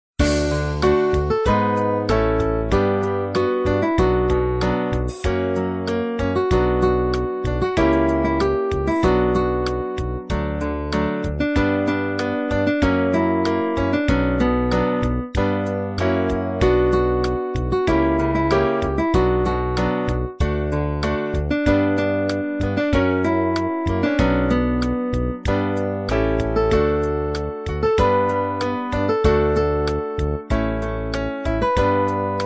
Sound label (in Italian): solo musica